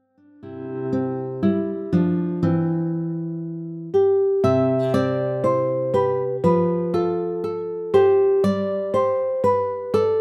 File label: BACKGROUND MUSIC